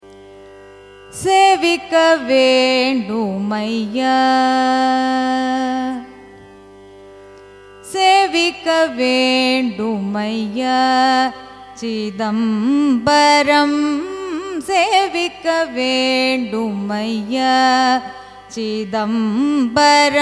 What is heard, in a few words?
raga andolika carnatic